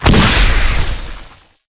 electrical death zap; Titanfall 2

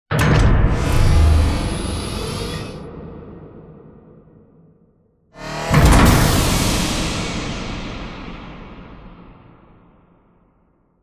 Giant Floor Panel Assemble Final Lift Mech; Boomtown; Sideways Town; Titanfall 2

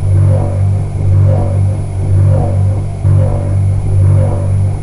fan scifi; boneyard; Titanfall 2

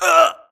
grunt death neck; Titanfall 2

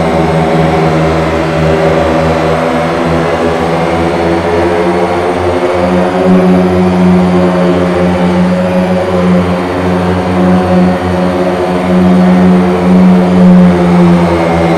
blue turbine loop close; Titanfall 2

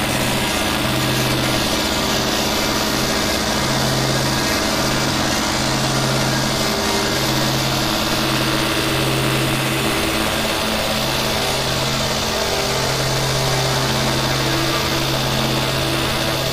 Worker Drone Hover; Titanfall 2

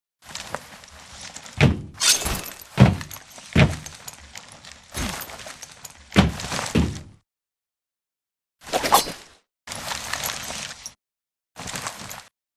flyin foley; commander; blisk; Titanfall 2

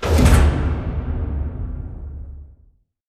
sliding door lg verb open stop; beacon; Titanfall 2

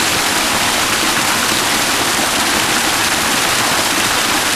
waterfall top; Titanfall 2

